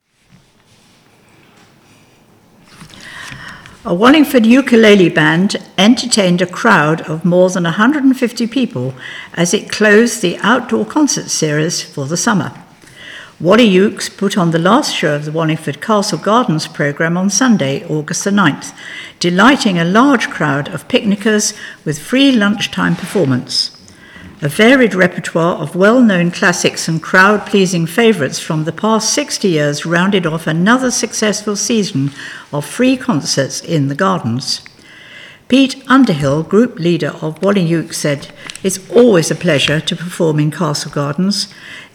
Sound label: Ukulele band; brings concerts to a close